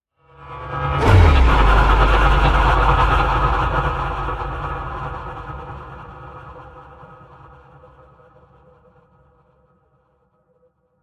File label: Impacts; Gothic Storm Music; Advanced Sound Design 2012